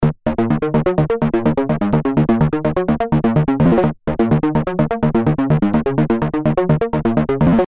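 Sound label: 126 E flat Chords Stabs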